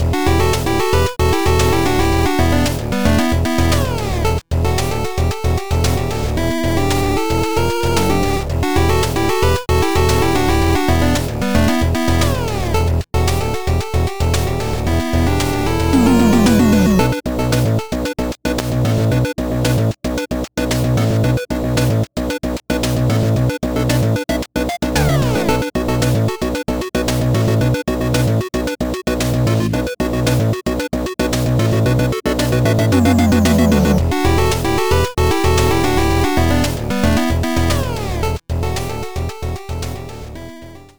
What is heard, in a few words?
Combat Chiptune